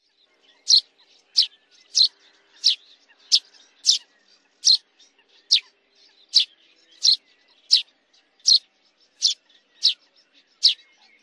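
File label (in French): Moineau friquet, oiseaux; birds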